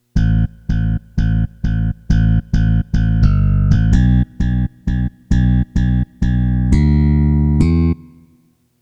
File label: bass